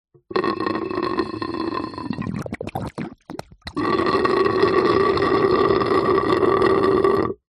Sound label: nature water gurgle light shallow bubble